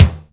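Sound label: R-8 full brite kick; SN-R8-05 Jazz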